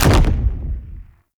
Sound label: Gatling Gun Start Stereo; Warframe; Tau Gatling Gun